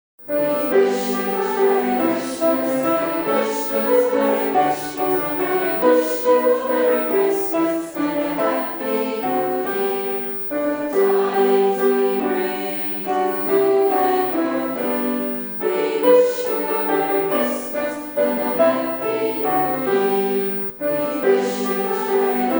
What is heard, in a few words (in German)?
Chor Weihnachtsgrüße mit Wiederholung; Gymnasium